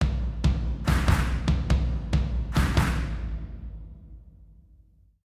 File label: drums